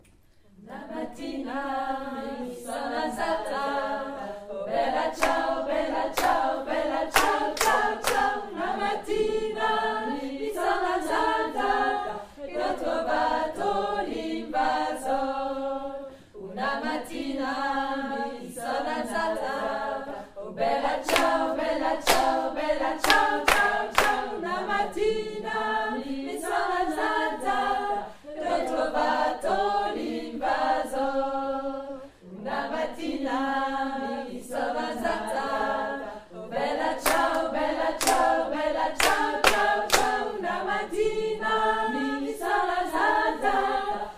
bella ciao debut 2 voix

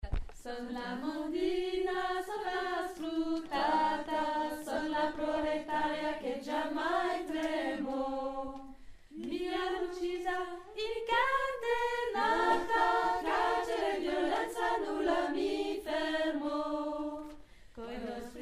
vx aigue

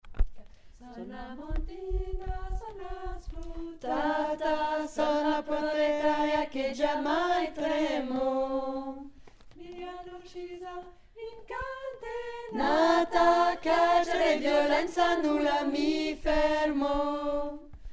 vx grave

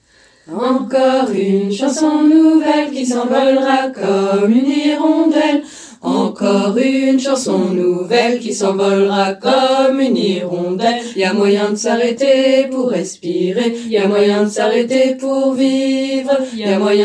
y a moyen basse

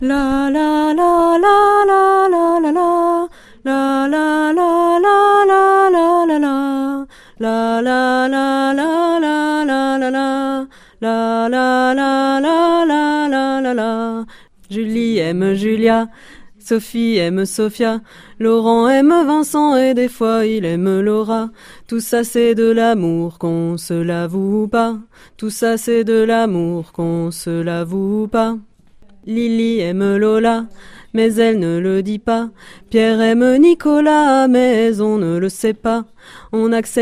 vx basse

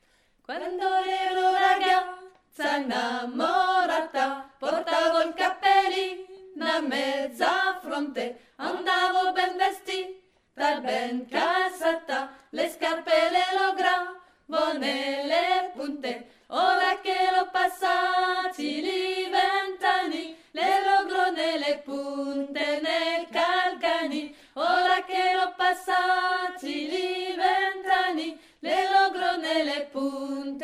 pan pentito voix

